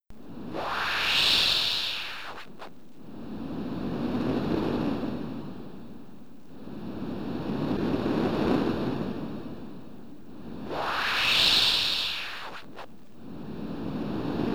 BGM B, SID Stereo; brain artifice; commodore 64

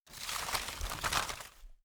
Photo Develop; This Is the Zodiac Speaking; PS4

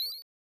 Basketball Coin; Michael Jordan Chaos in the Windy City

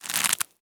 archer aim bow

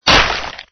skeleton bone break

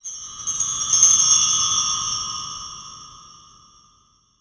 environment temple glitter

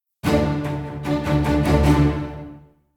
Combat Out; The Witcher 3 Wild Hunt; Skellige

